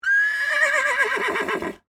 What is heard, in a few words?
Horse Clydesdale, Whinny; Animal; Horses (Sound Effects:Animals)